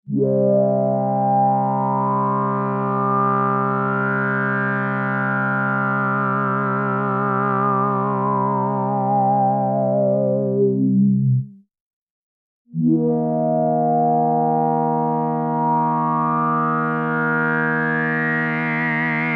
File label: delay vib; tellun